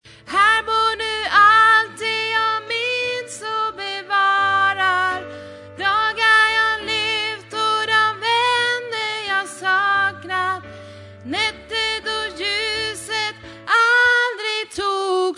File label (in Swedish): sopran